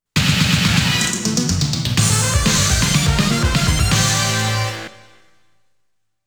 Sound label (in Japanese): ボーナス ステージ クリア BGM; konami amusement sounds 93 summer